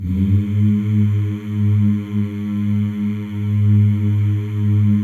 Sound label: male; Spectrasonics; Symphony of Voices